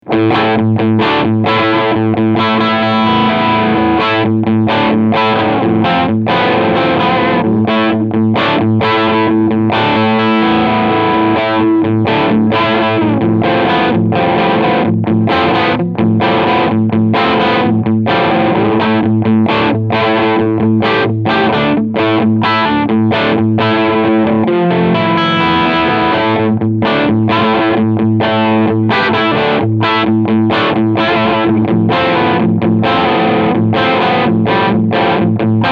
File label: Jackson Audio El Guapo Distortion BLUE